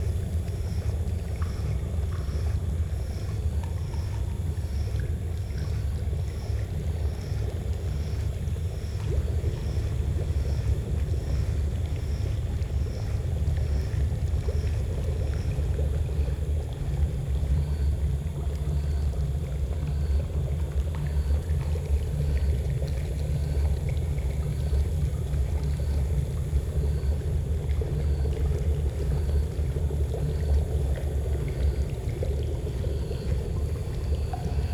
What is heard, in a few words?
amb colour lab